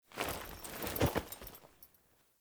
Foley Action Wolf Unmount, Clothing; Characters; Player

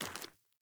Sandals Walk Rock; Characters; Player

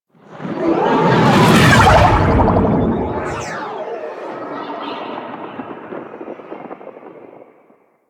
Insect Liset Fly By Slow, Vehicles; Liset Flight

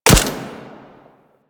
Shield Speeder Rifle Fire

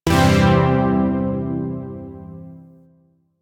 Shawzin Pent Maj Chord F; Chords; Warframe; Zariman

